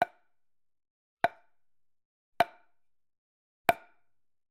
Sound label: Generic Click Wood Small; boom library; The Interface